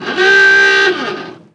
klaxon

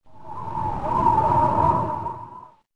empty recycle bin

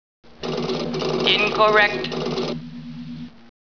incorrect; startrekorg

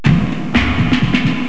beat

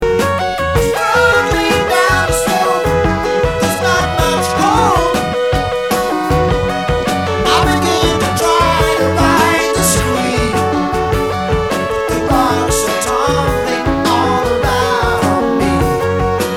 music

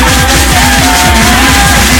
atomic loop

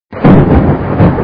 storm cr stop; citystorm

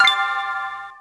exclamation; springriot

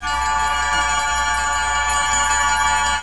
Ring In; TOS

